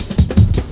Hard Edged Cut, Drum Loops; Zero-G Jungle Warfare 1, 2 & 3

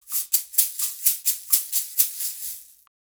SHAK, Percussion Loops; USB Soundscan vol.36; AKAI

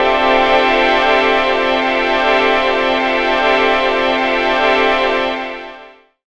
Pad Wash; Jungle; Pads; Zero-G Jungle Warfare 1, 2 & 3; 90 s Sample CDs

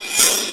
SPRING

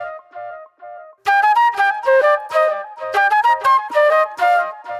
NY 70's Delay Flute

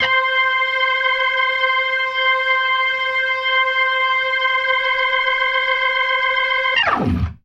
GLISS SPIN, ORGAN RIFFS; InVision Interactive; Keith Emerson; The Most Dangerous Synth and Organ

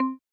RX8 Marimb, Yamaha RX-8

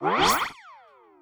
Speedy, cartoon

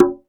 Dance Drums; Best Service; ProSamples vol.15; AKAI

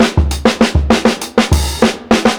60'S STYLE 100 BREAK, DRUMS LOOPS; BASEMENT DRUMS VOL 2

